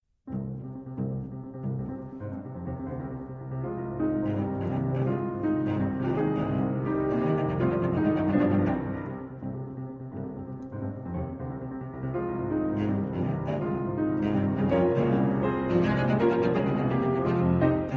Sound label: Cello Sonata In G Minor Op 19 2 Allegro Scherzando; Rachmaninov